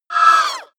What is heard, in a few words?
wraith hit; schreie